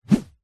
stick or thin object swipe swoosh whip through air; foley; zapsplat